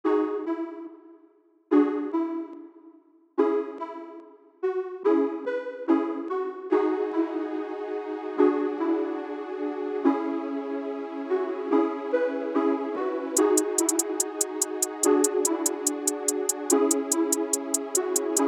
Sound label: piano